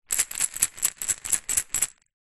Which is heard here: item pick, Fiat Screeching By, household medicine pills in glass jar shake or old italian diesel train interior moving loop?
household medicine pills in glass jar shake